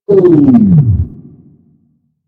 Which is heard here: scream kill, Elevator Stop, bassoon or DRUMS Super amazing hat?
Elevator Stop